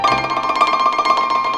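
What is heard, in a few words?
ZIMBAL MELODY; Best Service; World Colours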